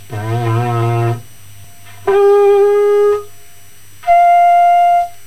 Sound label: pipe, brass